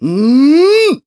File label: Run Attack Run; Ghirahim